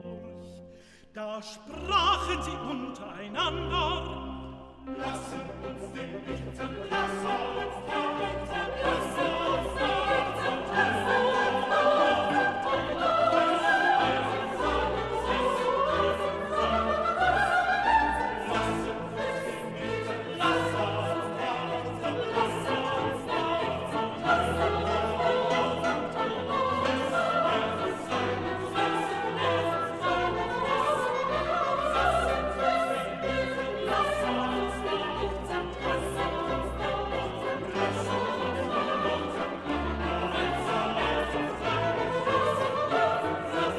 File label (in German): BASS, Stimmen mit Klavier; Johannes Passion